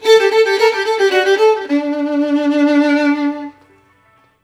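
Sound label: VIOLIN LOOP, Asian Traditions; USB Soundscan vol.60; AKAI; VIOLIN LP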